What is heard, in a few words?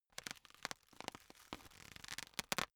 plastic pressured rustling long slow